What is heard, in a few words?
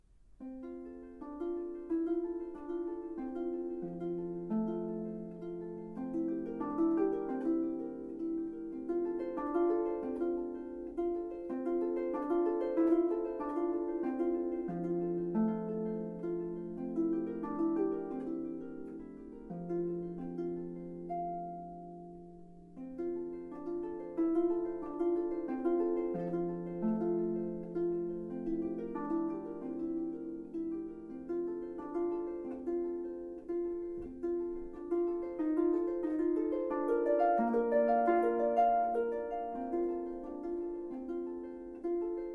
Steady Rain